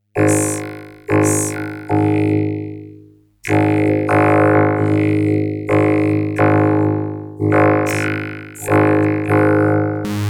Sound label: Notch Filter SUPER6 Pad; GRP V22; GRP A2